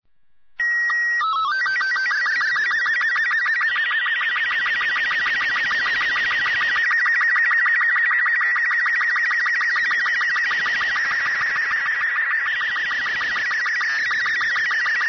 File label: ambient; Portal 2; Source Engine; video games